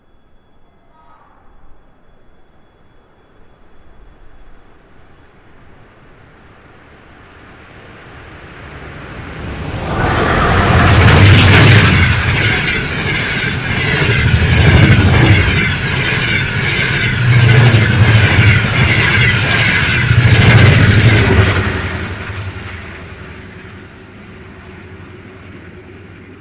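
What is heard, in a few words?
passenger train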